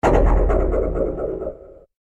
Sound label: impact